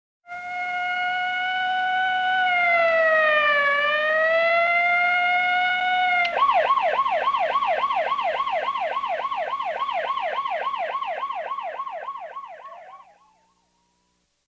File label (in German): Katastrophen Amerikanische Polizeisirene